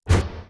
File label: swing